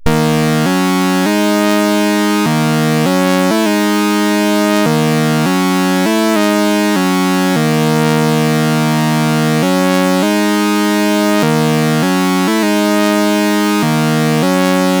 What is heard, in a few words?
sid, CSG8580R5; Escape from New York